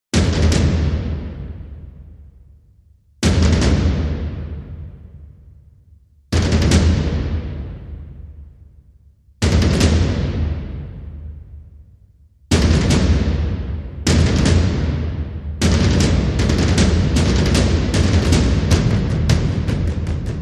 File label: Low Drums Only